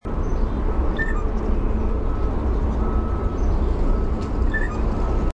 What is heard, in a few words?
BLJA pumphandle, nature sounds